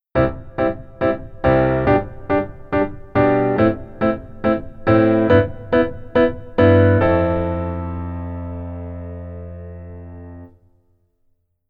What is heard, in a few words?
Keyboard Rhythm Basic